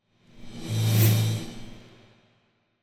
Drum And Thin Cymbal Hit Symphonic - Increasing; Hollywood Edge; sound designer's toolkit v2

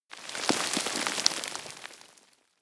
rubble; agent; Apotheorasis

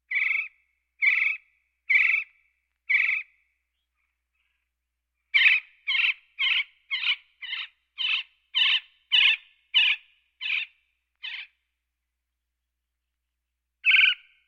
Birds

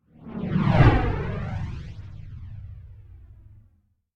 Whoosh Space Passing Phased; Hollywood Edge; sound designer's toolkit v2

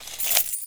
Keychain Zip; SFX Kit; Foley - Misc

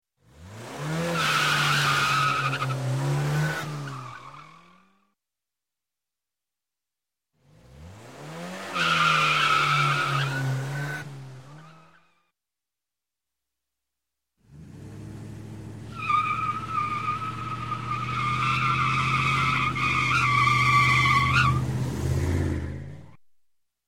Auto, Tire Squeal Rev Up Engine And Squeal Away; Skid; Sound Ideas 2000 Series

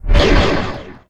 Pickup Scifi Energy; SFX Kit

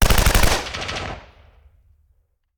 Machine Gun; Sound Ideas; Guns

